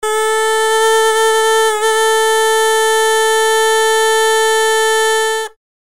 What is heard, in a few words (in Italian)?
Corru, strumenti musicali antichi; Gli strumenti antichi; URM Sonos de Sardigna